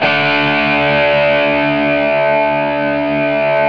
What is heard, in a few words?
GTR X POWER, Power Chds; Club-50 - Foundations Roland; Metal Chds